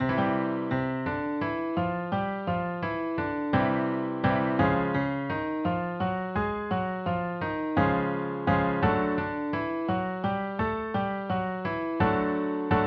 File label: 5 notes